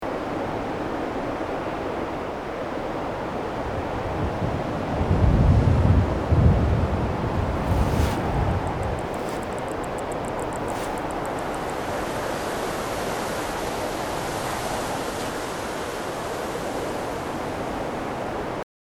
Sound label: weather night; fallout 4